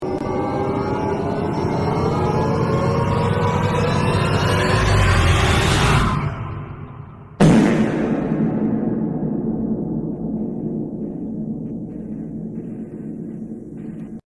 dramatic fart

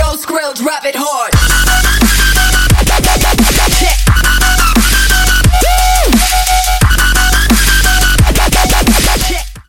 Drop the Bass Button

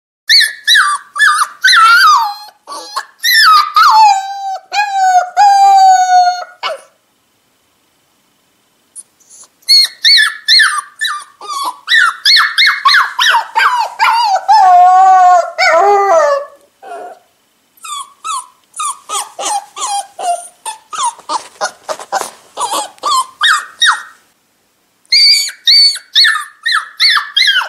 Puppy Crying Sound Dog Crying Sound; to Stimulate Your Dog; prank your dog; prank my dog; dog crying puppy crying sound effect

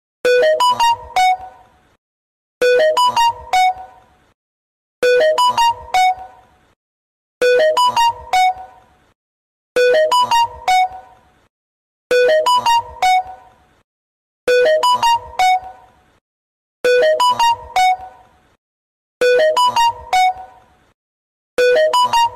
Android Notification Sound

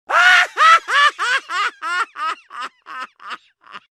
laugh; luigi; meme

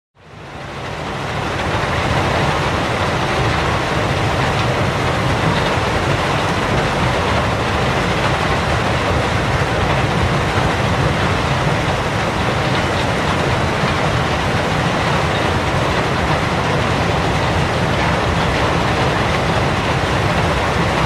Gravel Pit Sound Effects

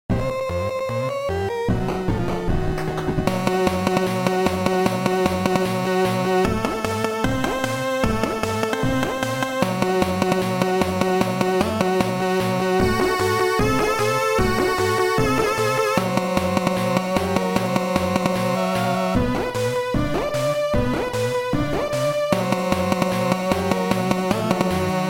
8bit; South park

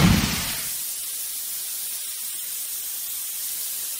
Guns Up smoke grenade; Voicy

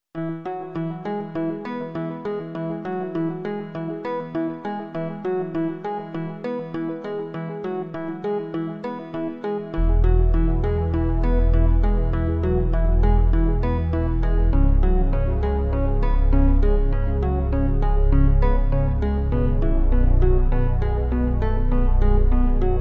Roblox music

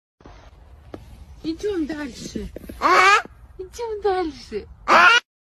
Confused cross eyed kitten

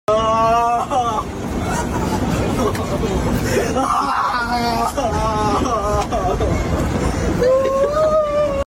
Guy whining meme sound

